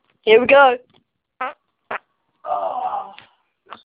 Real Fart

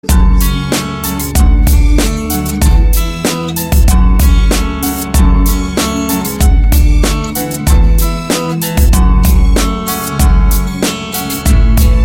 Guiter; Stalker

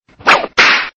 Whip

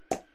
POP

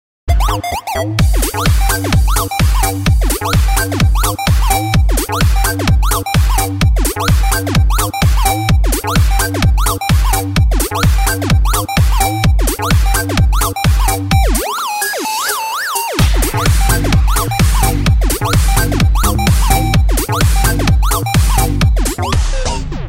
Elektronica

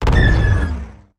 Blaster

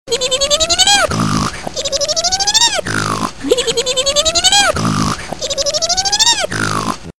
Backward Snore

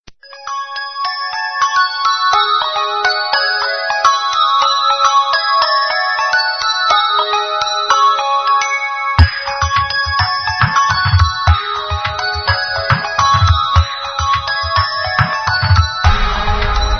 Cool Tone

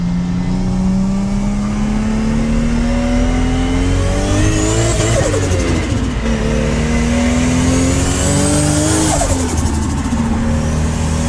Nissan Skyline Turbo

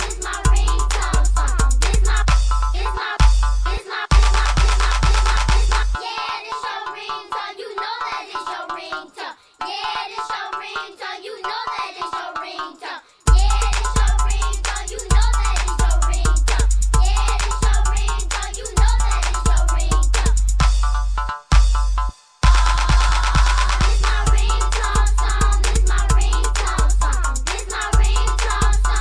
Best Ringtone Song

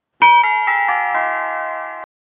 Doorbell Short

